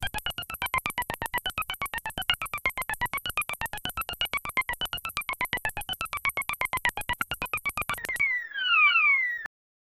ufo landing funky alien

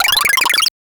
fast sequential sound of; sequence

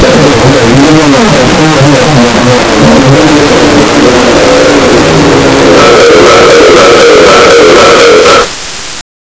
woosh

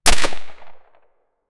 suppressed silenced gunsho; silent

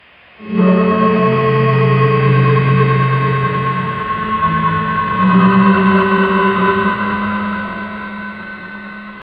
time slow

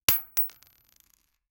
nut throw projectile

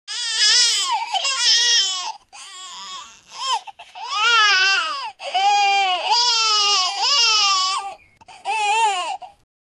baby crying older newborn

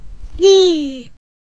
jelly sounds; plastic

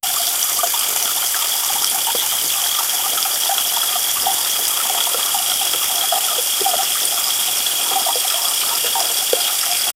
watering a plant

